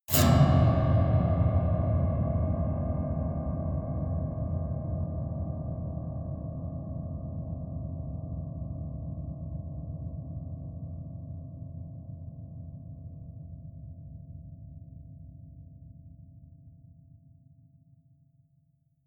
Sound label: piano twang multiple fast dull